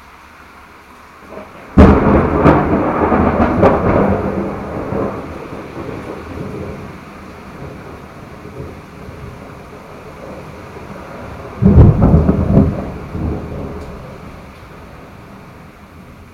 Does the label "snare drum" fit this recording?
No